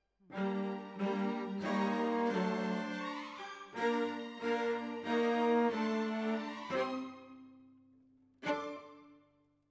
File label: Cellos